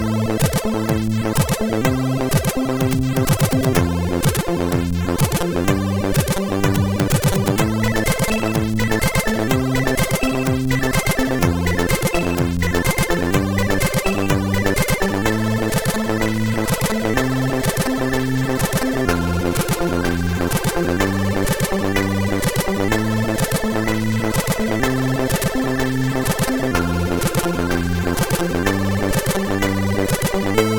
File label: Introzak, sid MOS6581R4